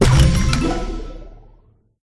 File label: upgrade; idle evolve